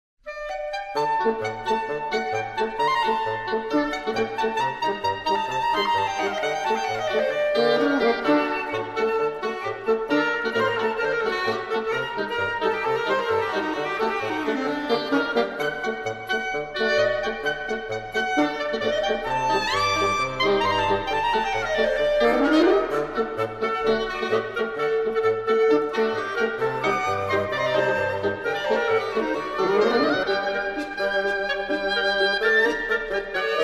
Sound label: wind quintet; paterson; klezmeshugeh